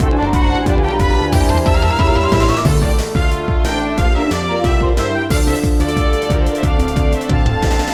Battle Grass Intro; Coromon

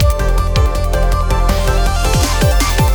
Snow Transition; sky rogue

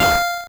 core squish

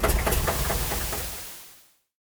vehicle out of gas

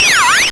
Beat

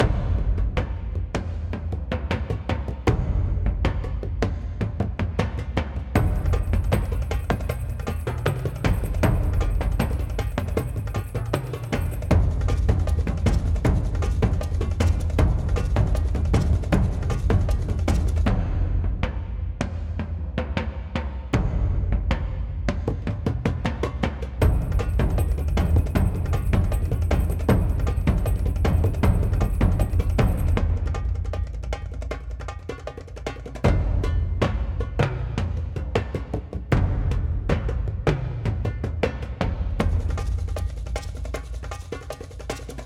Pub Brawl Upstairs Perc loop; Uncharted 3 Drakes Deception; ps3

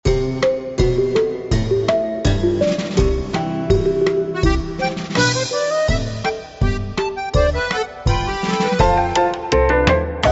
Timed Level; Cookie Jam

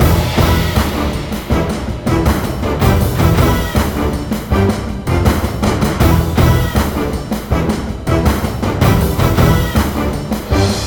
Ingame Field Raid Lobby; Cookie Wars

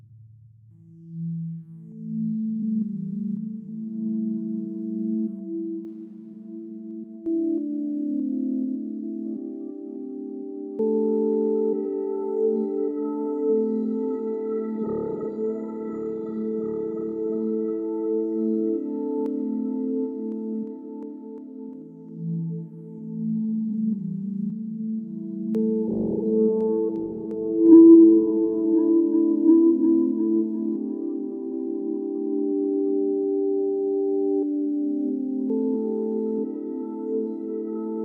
Ingame Garden Pads

